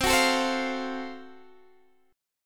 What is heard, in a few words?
Gb Minor Major 7th Flat 5th, chord